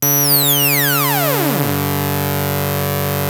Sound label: ARP 2600 D3, synthesizer; Hollywood Edge - Giorgio Moroder Rare Synthesizer Collection